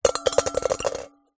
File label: foley plastic objects x2 small hollow drop into metal bowl